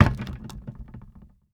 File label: Foley Plastic Tub Medium Set On Cement Floor